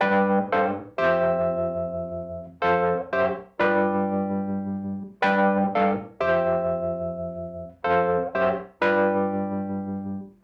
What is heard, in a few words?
Call And Response Wurly B, 70s Analog Funk; Sonic Foundry (Sony Creative Software); What it Is!